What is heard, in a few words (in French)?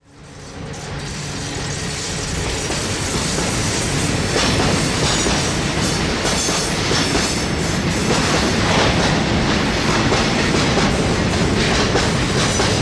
bruit joints de rails; sonotheque